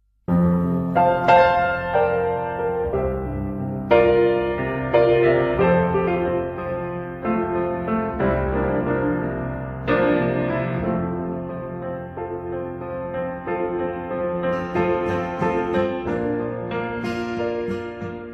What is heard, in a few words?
(karaoke)